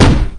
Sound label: head slam; auchi